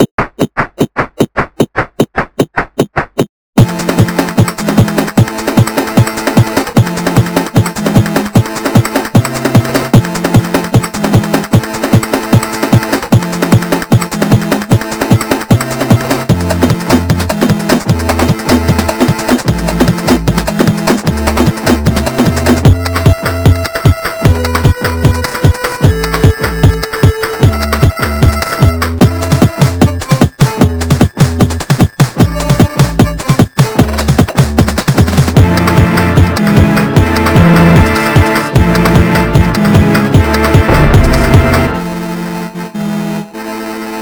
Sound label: 8Bit Madness